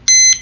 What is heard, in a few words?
success